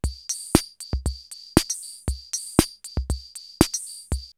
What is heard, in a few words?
CR78 BeatBox, drumloops, DrumMachines; X-Static Goldmine